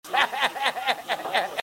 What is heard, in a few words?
hehehehe